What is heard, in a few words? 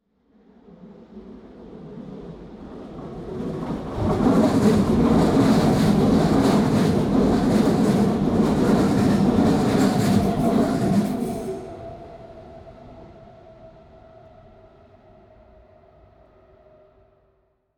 train passing; Golden Crayon Sounds